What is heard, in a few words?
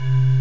blower